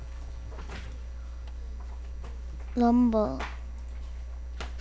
rumble

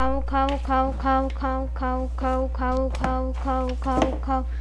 cow

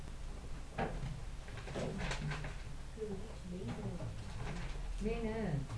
gun

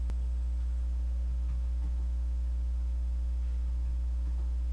success

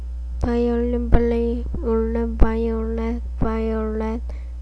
violin

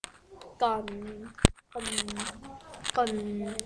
gun

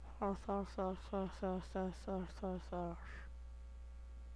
horse

